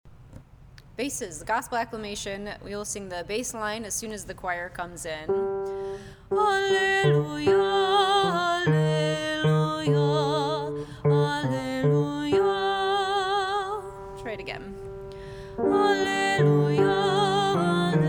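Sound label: Gospel Acclamation Easter bass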